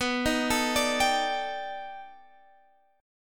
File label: B 7th Sharp 5th Sharp 9th, guitar; arpeggiated